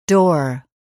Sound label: door